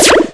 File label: LASER; Shadow Master